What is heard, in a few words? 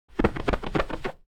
Window squeaky clean wiping and screeching